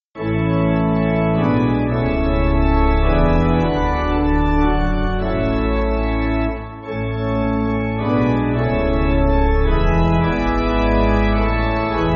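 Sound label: Pipe, Pipe Organ, Music Backing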